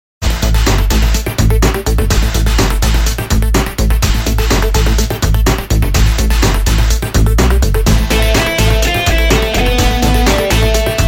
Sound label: Room Music; M.A.T. Mission Against Terror; MAT 1 Online; windows